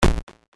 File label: kick; superPlatformLand